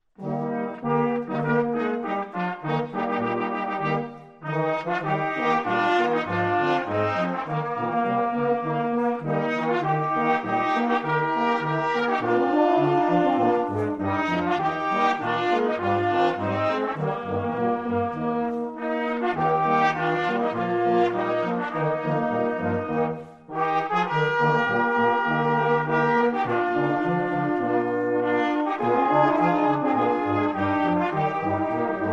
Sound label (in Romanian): Fanfara